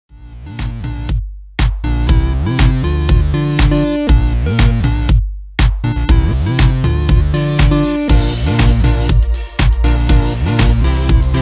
Tik Tak Disco

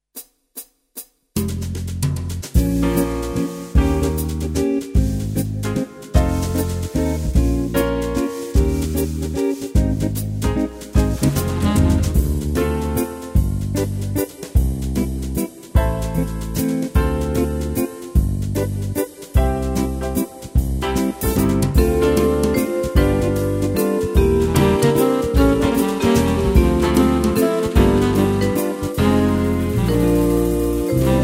Jazz Waltz; tyros2; March Waltz

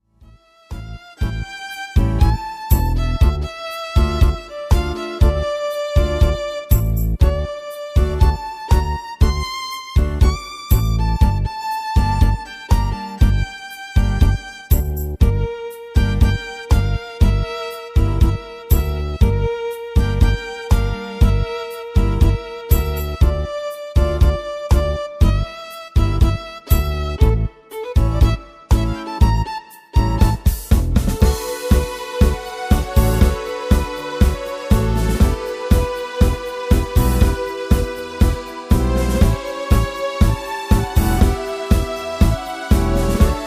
Tango, Tyros 3

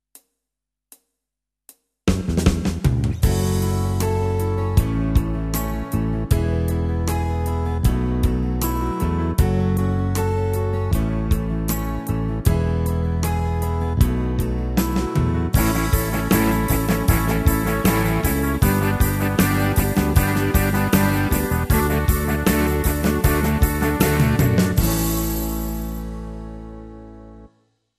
Brit Rock, tyros2

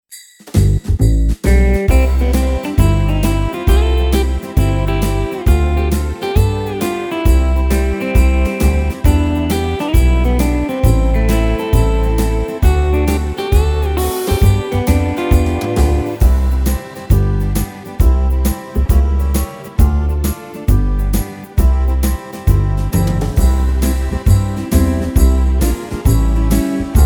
Christmas Swing, Tyros 5

